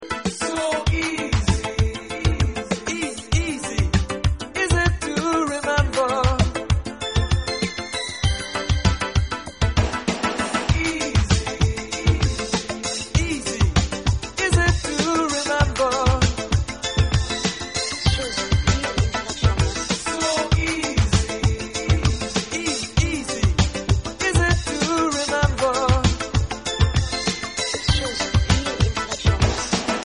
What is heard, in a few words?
dub reprise